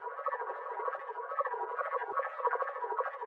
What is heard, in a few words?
Platform Eating Loop; doodle jump 2; android